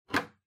TV Hardware HDMI Unplug

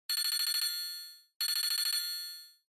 Feature Phone Ringtone

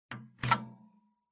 PC Hardware CF Card Eject

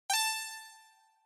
Inspiration Low

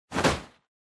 Motion Grab Strong

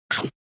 Motion Eating Dry

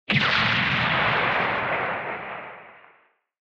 Retro Anime Gun Long

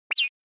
Anime Motion High

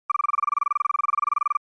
SNES RPG Message

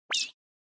Onoma Surprise Low

